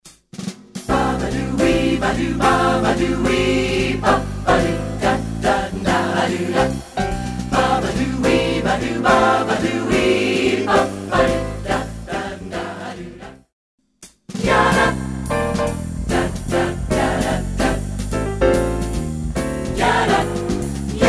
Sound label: vocal jazz style